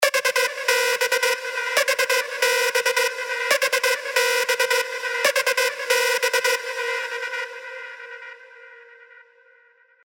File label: Trance Gates; Screech Exotrax; Revealed Serum 2 Trance Gates Vol. 1